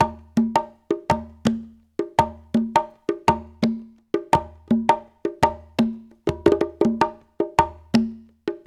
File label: Latin Congas, African Contemporary; Spectrasonics; Supreme Beats